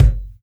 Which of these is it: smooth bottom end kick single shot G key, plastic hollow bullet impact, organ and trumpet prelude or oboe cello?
smooth bottom end kick single shot G key